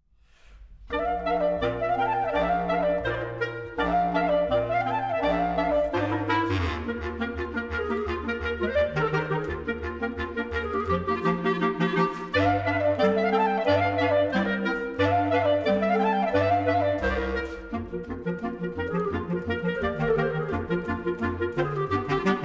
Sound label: Clarinet Quartet Romanian Folk Dances; Maruntel